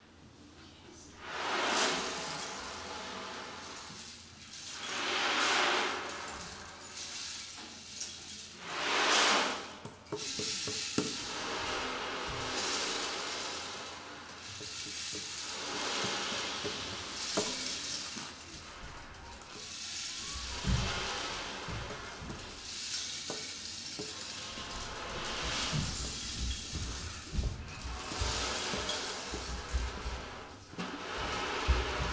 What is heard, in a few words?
Sea soundscape; Diamonds